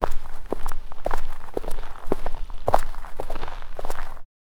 sounds of footsteps walki, slow

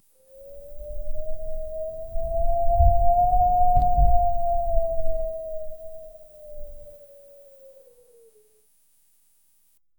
blustery winds whistling; air movement

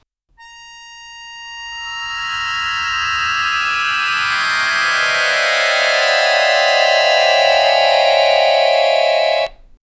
3 ascending notes, accordion

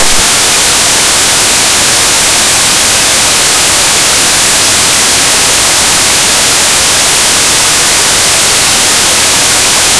indoor

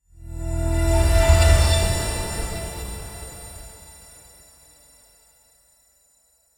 short elegant golden shim, luxury

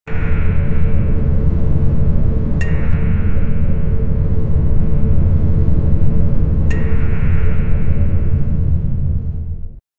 the overloaded sound of a, metal rattle

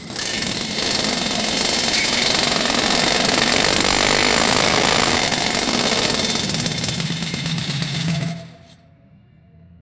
formula 1 pit stop wrench; motorsport

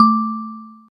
minimal clean bell tin, xylophone

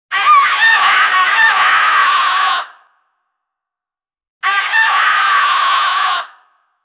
raptor sounds raptor roar, dinosaur

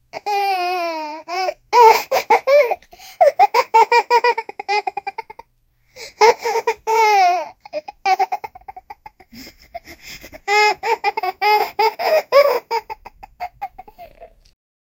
a laughing baby

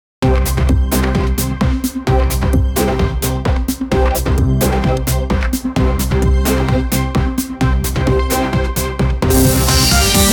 exciting dance, gameshow

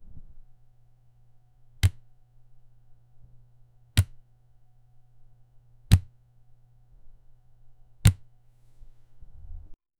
fist pump

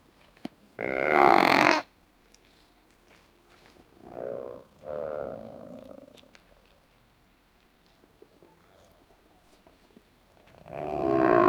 a 18 years old man, bodily functions

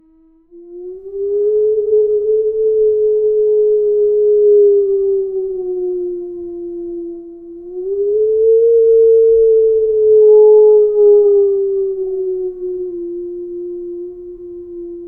wolf deep howling long; wolves

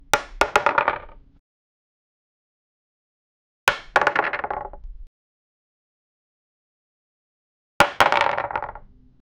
wooden dice hitting woode

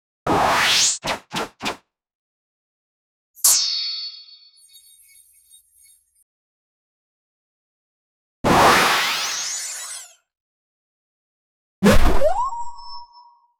make an anime skill like, anime

